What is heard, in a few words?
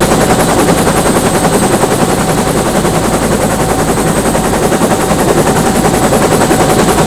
heli; svencoop; artifact